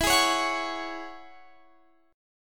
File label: Ab 7th Suspended 4th Sharp 5th E